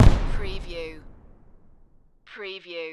Firework Boom Single

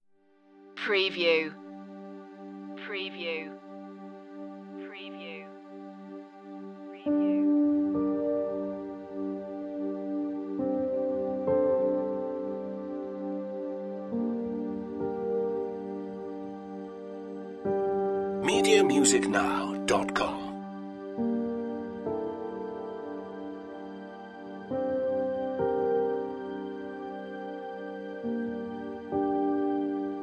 what lies underneath no clarinet

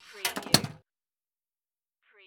Pinball sound, slide clank and clunk

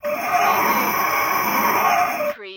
Alien Gasp Breath; sound effects bible

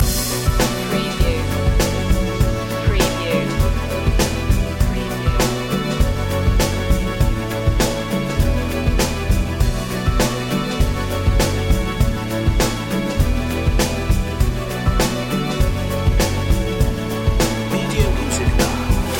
electron loop

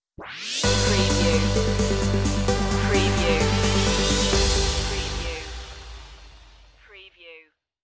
positive energy stinger

